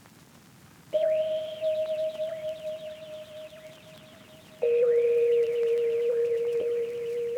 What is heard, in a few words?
Dark Melody Loop - 130 BPM D Min Pad; Cymatics; Oracle Sample Pack